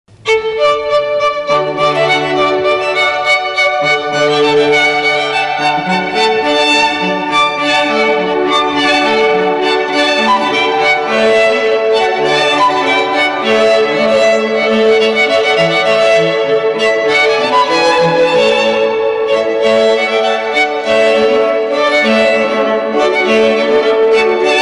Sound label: Stringquartets